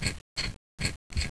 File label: silencer off; svencoop